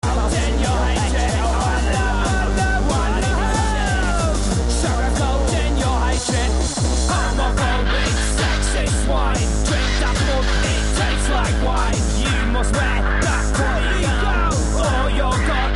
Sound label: lfo